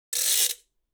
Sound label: ratchet